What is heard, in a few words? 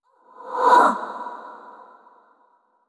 surprised short; yamiko